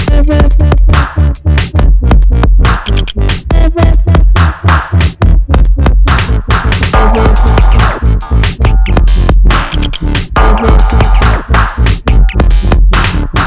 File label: dubstep